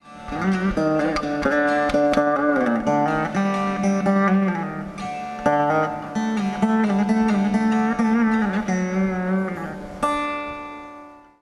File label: sarasvati vina; musique indienne